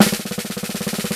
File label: Snare Fill, X Drum LM8953; Drum Samples